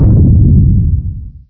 interior explosion distant

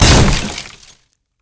wood bang

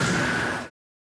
shoot

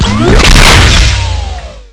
electro

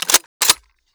pump seq, shotgun

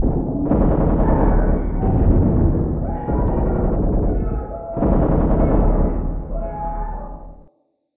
distant fighting

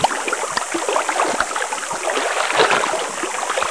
lava ambience

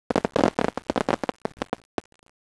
crackling, fireworks

